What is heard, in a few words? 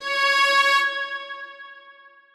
Strings kiai